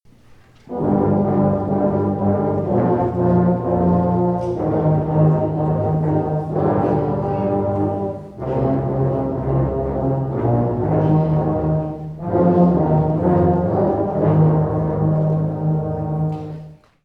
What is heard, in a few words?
Low Brass